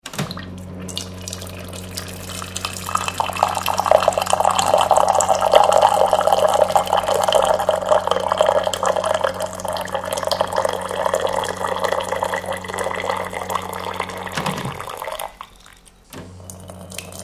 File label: Water Dispenser Electric, Pouring water into cups; Pouring water into cups from dispenser; Miscellaneous Office